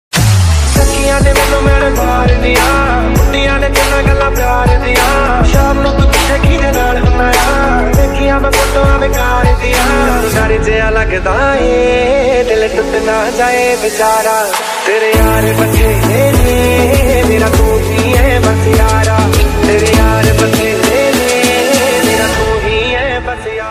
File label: Bollywood Song